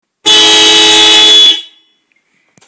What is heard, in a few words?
bip